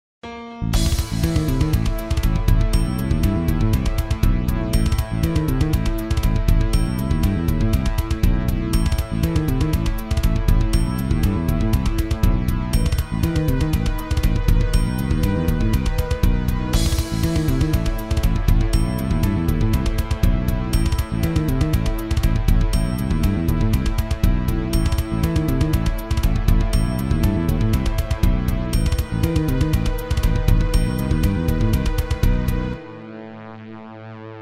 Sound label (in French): playback, metal hirajoshi hongrois mineur